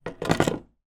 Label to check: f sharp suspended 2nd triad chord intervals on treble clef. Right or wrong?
Wrong